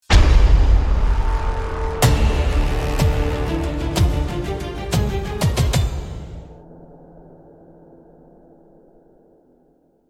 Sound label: Epic cinematic build swell riser